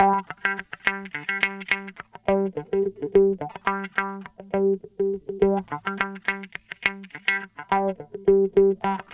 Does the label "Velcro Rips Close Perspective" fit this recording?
No